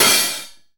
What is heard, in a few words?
HAT ORING, VINTAGE FUNK; Zero-G; Funky Elements